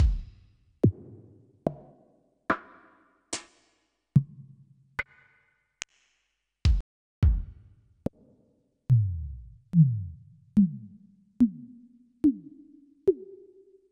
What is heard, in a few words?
Yamaha Tenori-On Echokit